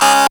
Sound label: syn, Dance Synths, Trancers; Trancers 2